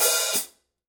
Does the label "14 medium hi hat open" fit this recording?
Yes